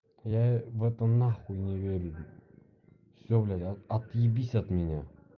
Trap Bass

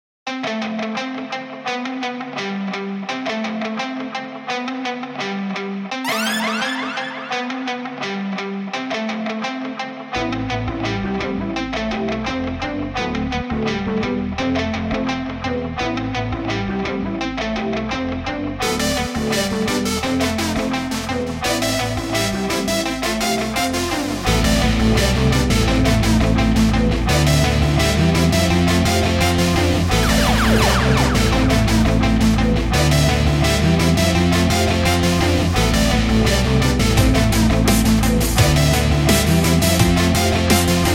Shredding Bass

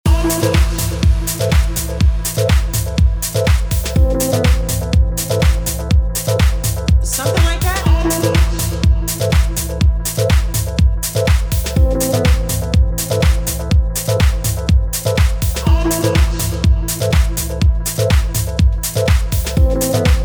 Tech House, Sample Pack; Airbuzz Recordings

